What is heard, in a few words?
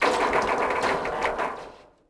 suspension cables move